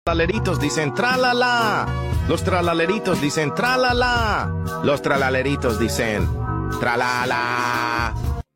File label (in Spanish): Los tralaleritos dicen tralala